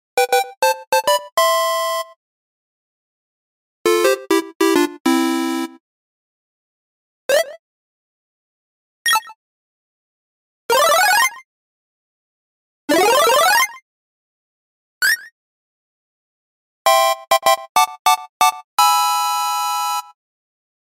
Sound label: 8 bit game sound effects